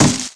potted plant; bouncyobjects; Tony Hawk's Project 8; XBOX

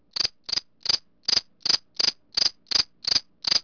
cricket; Pirates Of The Caribbean

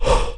ranger pant out

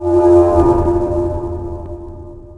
whale, ships engine; Quantum Redshift; XBOX; kaitlyn

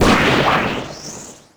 kick boost, skater; Tony Hawk's Project 8; XBOX

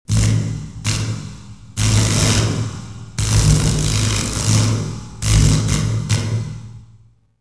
arc

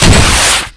Launch; vladimir

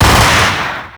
Shoot Ingram Dual; weapons; Max Payne

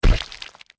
limb explode, gore; Soldier Of Fortune II Double Helix; XBOX

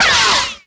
lightsaber deflect laser; weapons

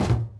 footstep walk hollow metal

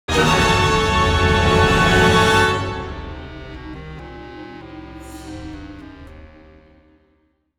Ramp Down, music

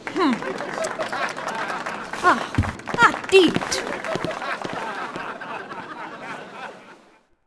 dance outro bad; Sid Meiers Pirates; XBOX

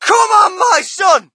crowd, shout; Mike Tyson Heavyweight Boxing

